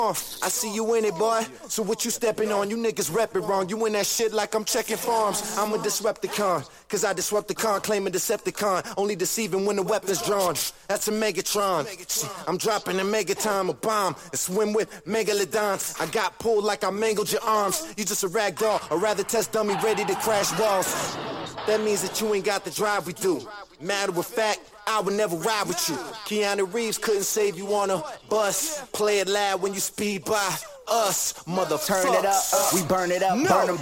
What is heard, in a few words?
acappella